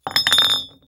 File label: Ceramic Cup Hard Down; Fairlight Sound Lib